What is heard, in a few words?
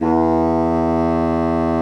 BASSOON E2; Xsample VOL-6; C BASSOON